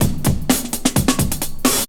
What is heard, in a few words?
HUDDEL 128, loop, 122-146 BPM